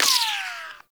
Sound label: Bullet Impact Ricochet; Warframe